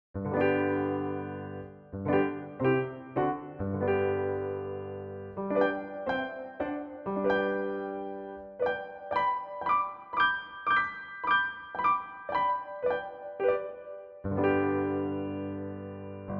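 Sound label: piano with reverb; Pianoteq; quick demo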